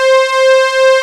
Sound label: PAD C4; Best Service Gigapack 1